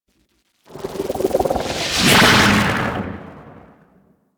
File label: Queens Chamber Transference Switch To Frame; Warframe; The War Within